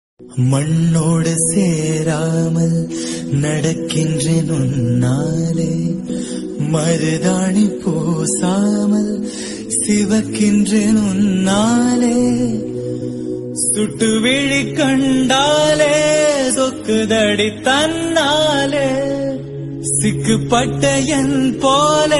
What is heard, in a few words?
Tamil wedding song